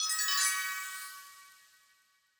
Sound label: medal get silver; CrossCode